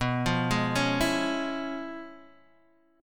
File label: C sharp 7th Sharp 9th, chord